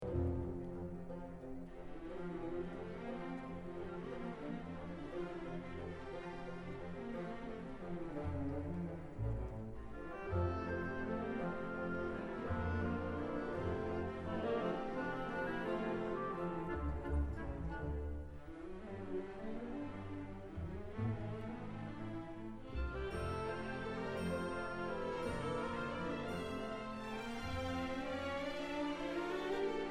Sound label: Bassoon & Violas; III - In ruhig fliessender Bewegung; London Symphony Orchestra; Mahler Symphony No. 2